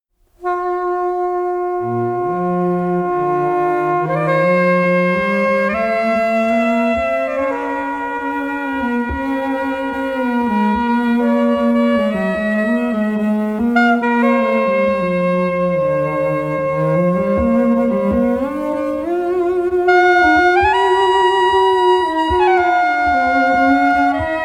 Bass & Horn

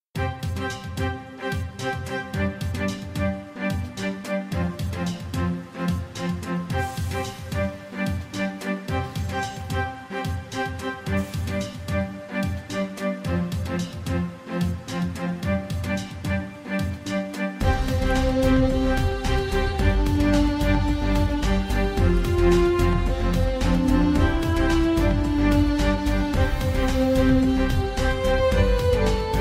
Steampunk Music

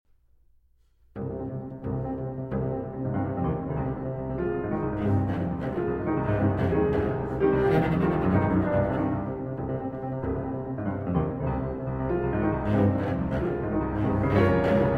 Cello Sonata For Cello and Piano in G Minor; Allegro Scherzando

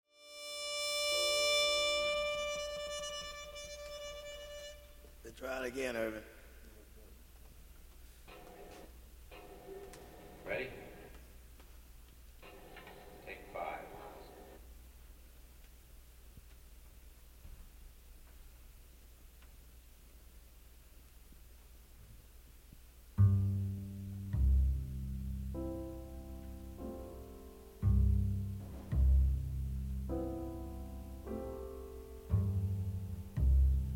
Flamenco; موسیقی جاز

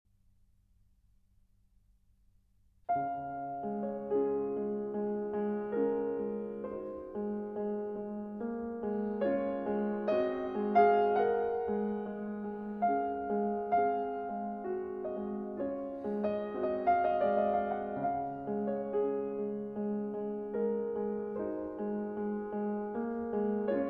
Preludes, Sostenuto in D-Flat Major; Op. 28 No. 15; Raindrop

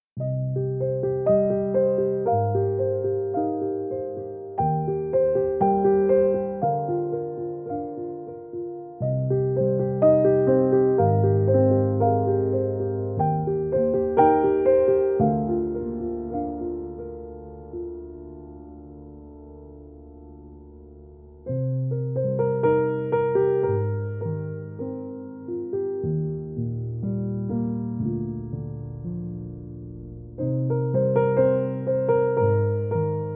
Arr. For Piano

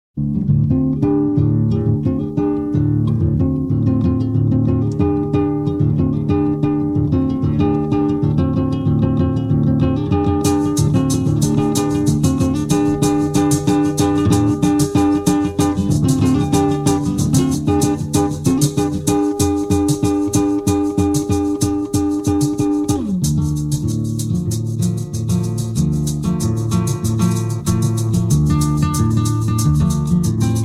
Berimbau

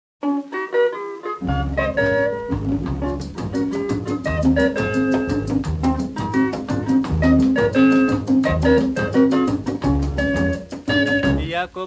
African Jazz

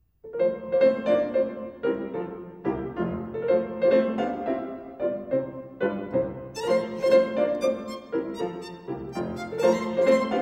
Sonata in F Major for Violin and Piano

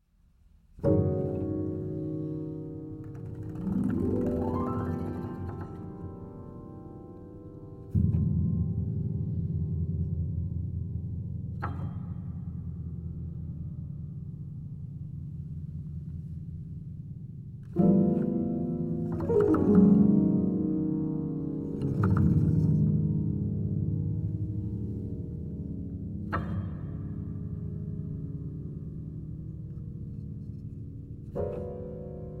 Sleepily (Upright Piano)

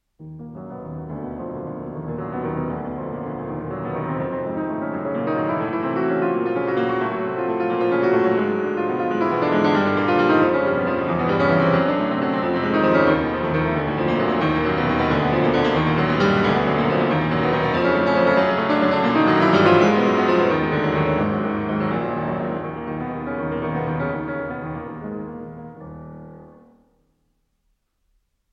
Préludes In E Flat Minor

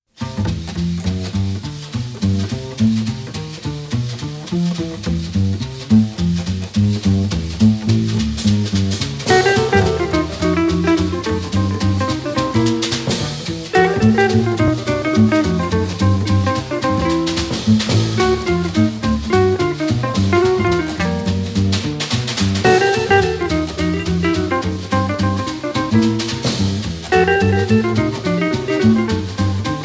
Two Guitar Blues

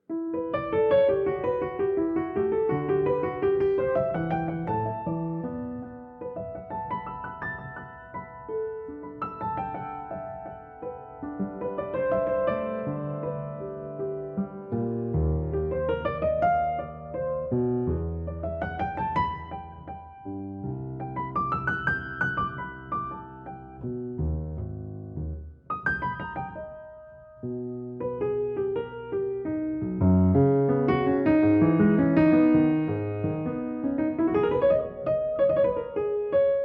Improvisation

